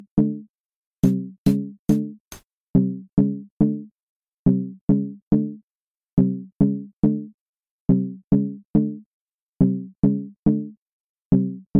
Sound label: Beat